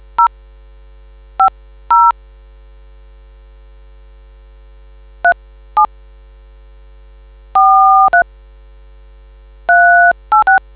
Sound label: dtmf